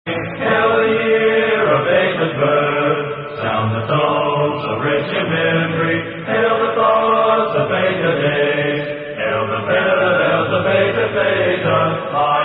Ring The Bells Of Old Miami, the singing fraternity; beta theta pi